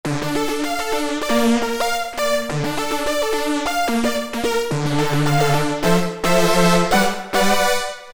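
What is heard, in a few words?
String Nervoso Strings, Alesis Micron; Factory Programs; Nervoso Strngs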